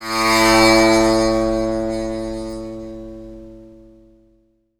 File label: TAMPURA; Spectrasonics; Heart of Asia